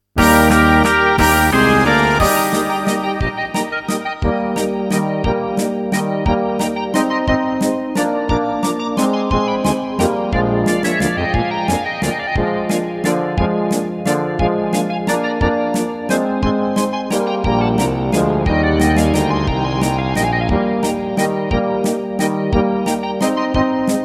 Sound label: karaoke